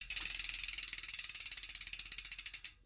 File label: Bike Wheel Pawls